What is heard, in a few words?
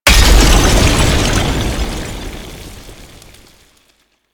Vial Hazard Explosion; Entrati; Hazards